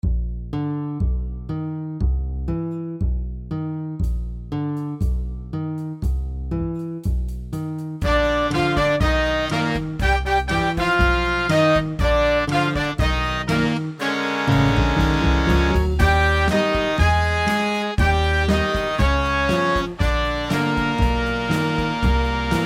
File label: Snorlax i Kårsta 120 bpm